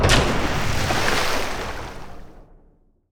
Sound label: dead water destroy; tidehunter